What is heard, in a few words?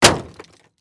Impact Plastic Smash